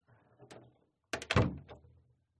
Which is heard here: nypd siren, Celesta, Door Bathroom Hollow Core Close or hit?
Door Bathroom Hollow Core Close